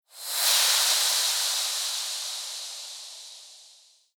EQ Filter Before

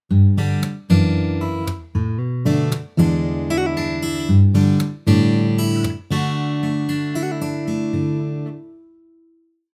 guitar

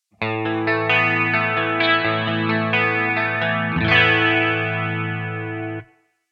guitar Ensemble

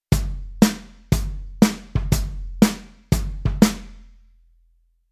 Rhythm Shuffle

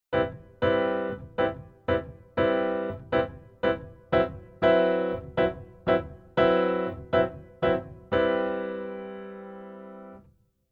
Keyboard Pf Bossa Pf

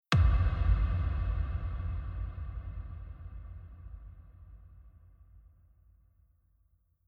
FutureBass Mix FX Hit Reverb On

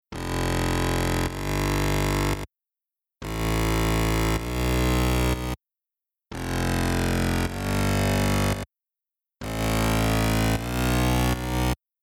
Future Bass Rhythm Bass EQ On

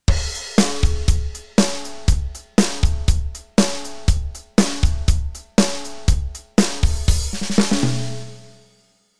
Rhythm Kit Pop

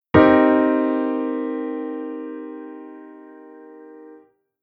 Chord m7

